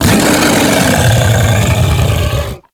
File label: Feral Vocal Angry; Warframe; Kubrow